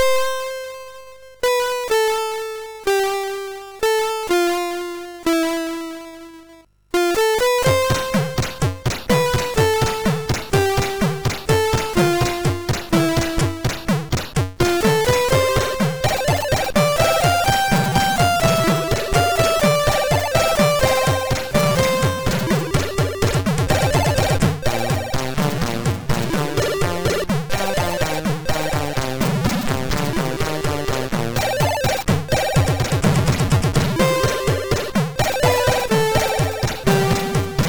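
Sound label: Pac Mann, MOS 6581 SID chip music, games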